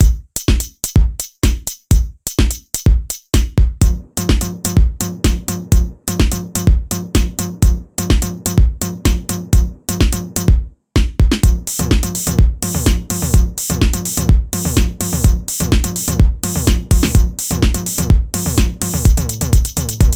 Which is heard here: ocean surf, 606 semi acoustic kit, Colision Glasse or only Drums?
606 semi acoustic kit